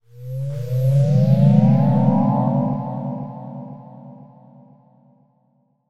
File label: sound design power up delayed warm